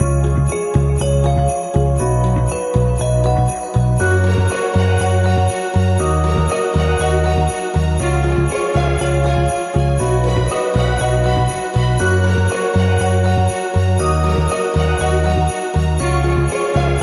Soft Christmas No Melody

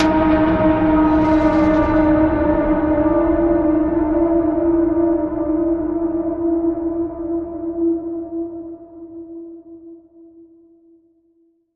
sting sinister

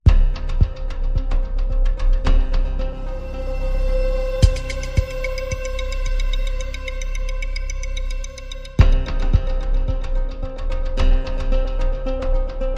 No Orchestra

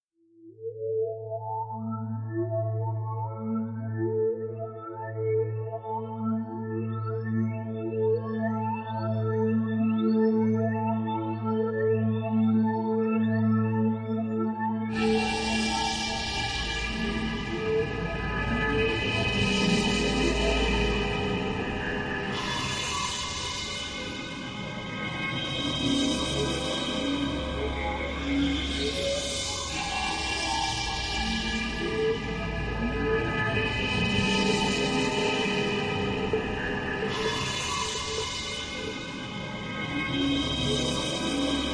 Drone, Light

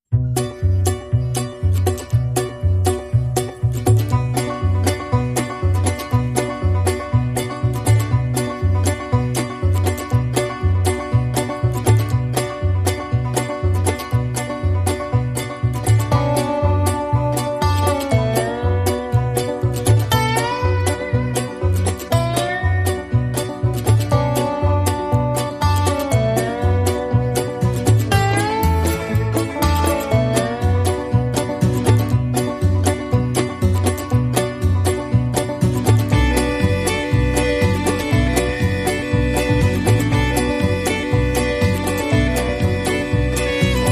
Banjo, No Percussion

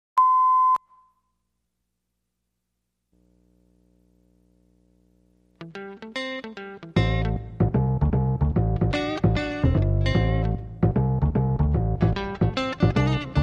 Texas Blues

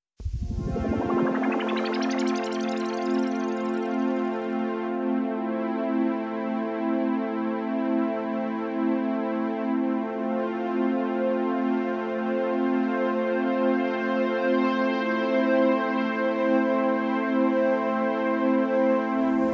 Synths

